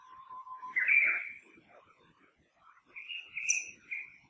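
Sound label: uguisu, Japanese bush warbler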